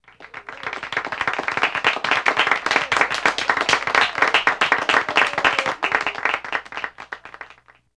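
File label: Applause 12 People Light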